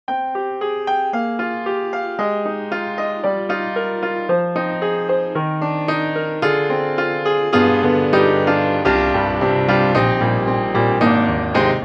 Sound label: Big Grand Cathedral; VI ONE